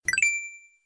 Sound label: beep; animal crossing